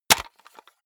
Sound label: cassette case close hard